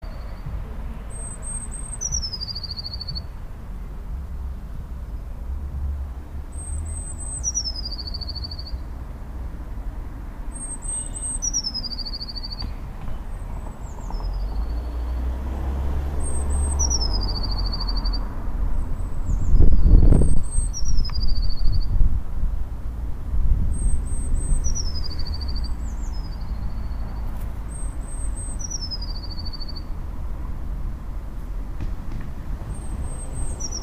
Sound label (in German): blaumeise